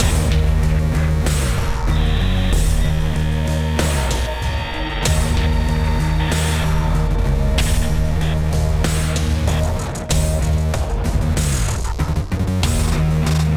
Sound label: Loop Music; Darkwebz; Carmageddon Crashers; Android, iOS